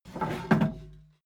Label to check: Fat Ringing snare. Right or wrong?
Wrong